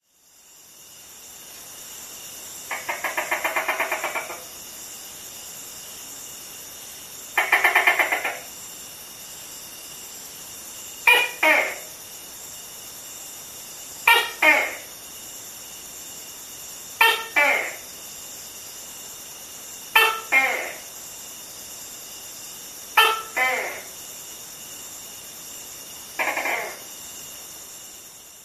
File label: Mating call of a male Tokay gecko (Gekko gecko)